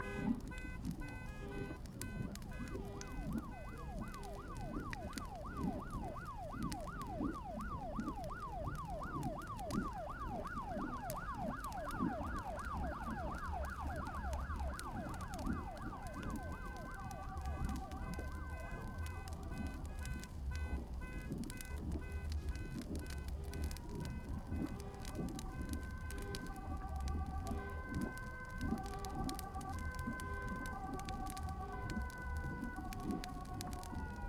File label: Apocalypse Siren Loop